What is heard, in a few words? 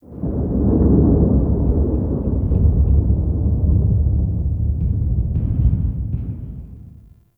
Thunder; Warframe; The New War; Narmer Outpost